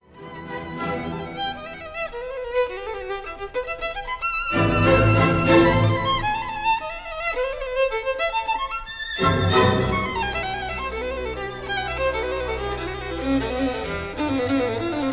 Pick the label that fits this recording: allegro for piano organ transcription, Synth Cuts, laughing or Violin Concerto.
Violin Concerto